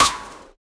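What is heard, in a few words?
tight drill snare one shot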